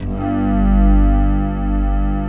SAD ACCRD; TRISTESSE

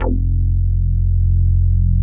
JX HARD F2, HARD BASS; Drums In Your FACE!

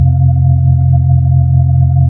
B-3 Chorus

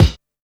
Snare, Drum and Bass

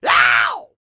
YELL, MALE HUMAN; Spectrasonics Vocal Planet

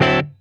D9 CHOP, Funk Guitar; Zero G - Funk Guitar

